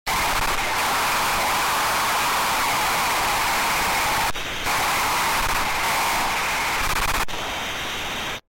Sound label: jump